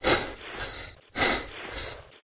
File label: breath, human woman; Stalker Anomaly